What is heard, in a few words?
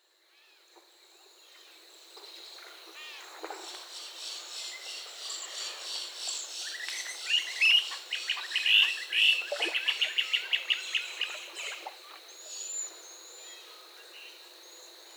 Anhinga Trail